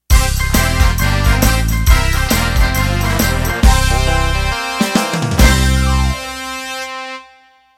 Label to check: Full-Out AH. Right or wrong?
Wrong